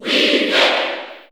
Cheer English PAL; Wii Fit Trainer; SSBU

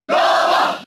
Cheer; Robot; Brawl

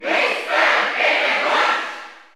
Cheer German; Mr. Game & Watch; SSBU

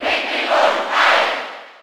Cheer German; SSB4

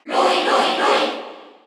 Cheer Korean; Roy Koopa; SSBU

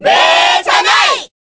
Cheer Korean; Meta Knight; SSBB

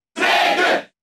Cheer JP; Snake; Brawl

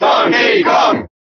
Cheer German; Donkey Kong; SSBB

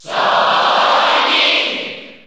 Cheer Italian; Sonic; SSBU

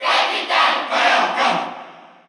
Cheer Russian; Captain Falcon; SSBU

